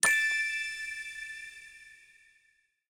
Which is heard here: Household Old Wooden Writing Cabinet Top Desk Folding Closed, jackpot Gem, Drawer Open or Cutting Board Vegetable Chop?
jackpot Gem